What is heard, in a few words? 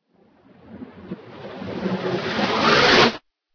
camera suck; Minecraft; Unleashed; thaumcraft